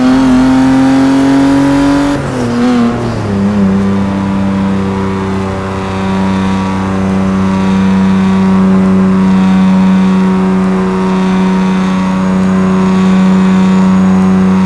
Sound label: fourth cruise, vehicles; gmod; tdmcars; for taurus 13